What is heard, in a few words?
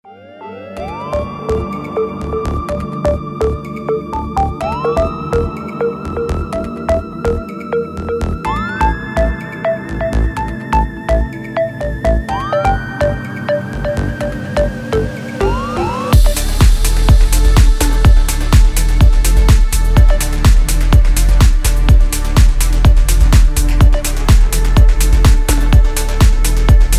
alarm